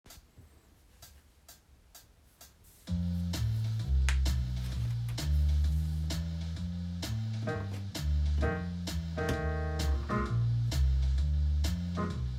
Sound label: F blues blues scale